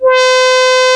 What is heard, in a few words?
OC-BRT LW C5, SYNTHESIZER